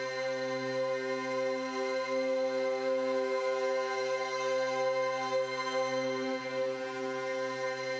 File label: Drone; stereo toolkit samples; Non Tempo Loops